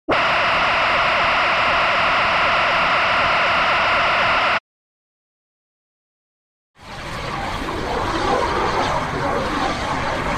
space alien radio signal; radio signals

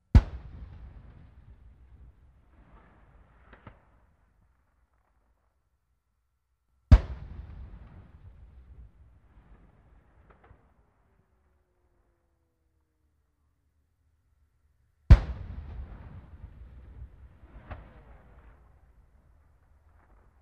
Tank shot from a distance

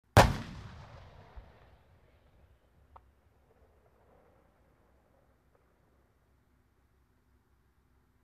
Tank shot

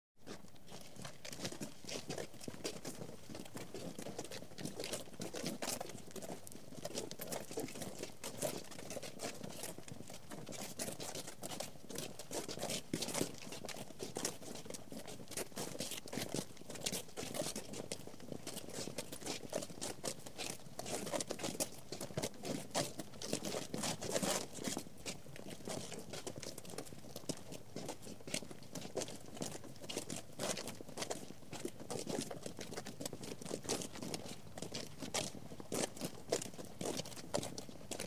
Several people steps on a concrete floor

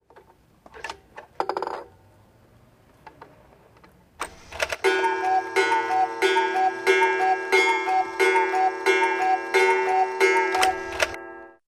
Cuckoo in the wall clock; watches

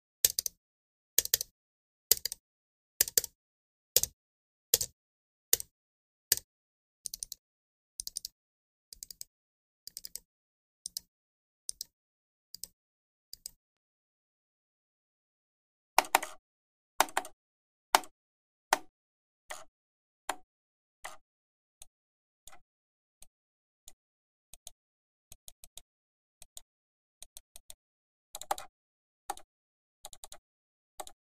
Multiple mouse clicks

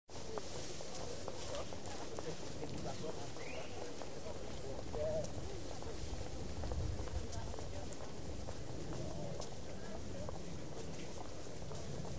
City, park, birds, conversations, steps of people

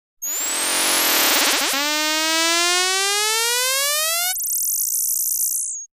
Electronic signal; radio signals

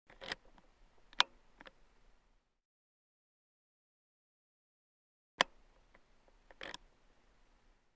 Motorcycle ignition key insert, remove

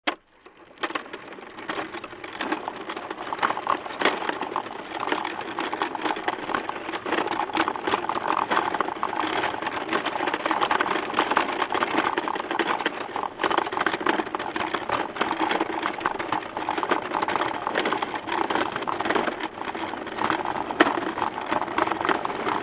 Wooden cart

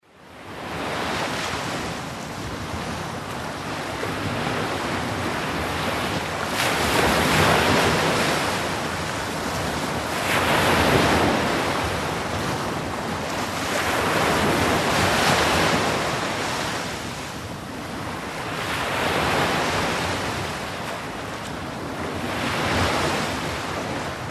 Waves of the sea, ocean

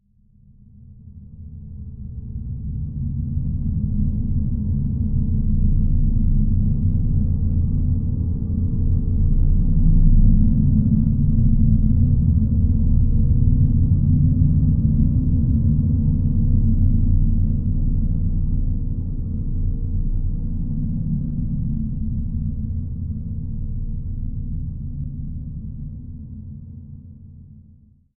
Submarine sails underwater

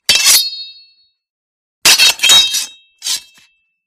The sword is taken out, sheathed; cold weapons

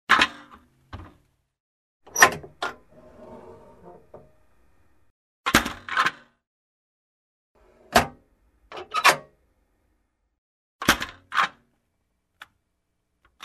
Small safe open, close

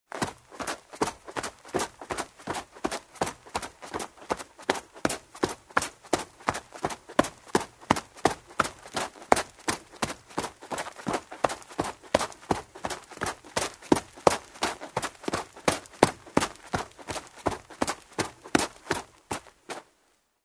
Running in the snow; steps

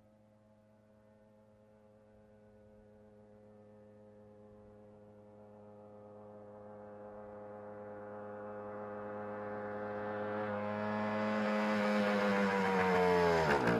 Motorcycle approaching, turning off

